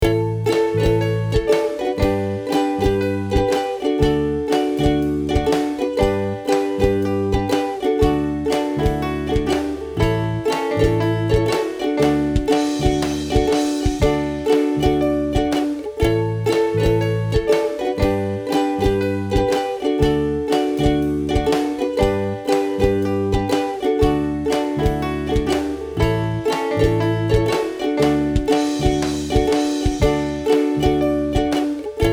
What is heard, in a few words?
ukulele